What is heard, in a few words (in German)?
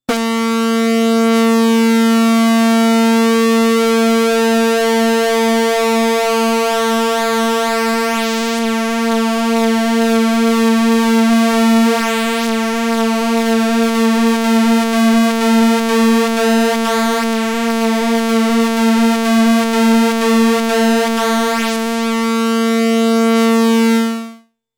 PWM Sägezahn